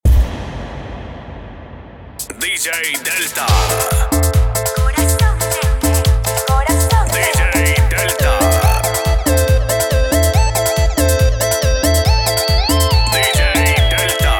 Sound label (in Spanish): entrada